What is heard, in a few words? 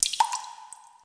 DROP; JUST FOR FX